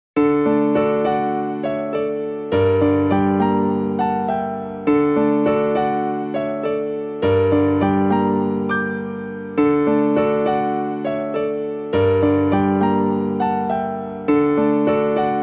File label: piano; maoudamashii